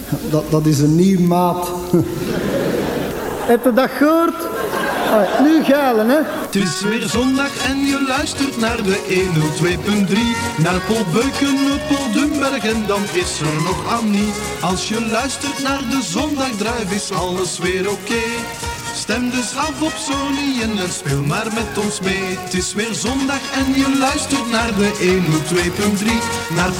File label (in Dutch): Jingle